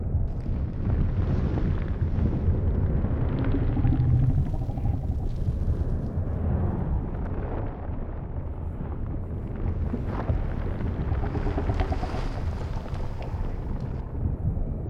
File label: Snake; KardaMahal Underground Temple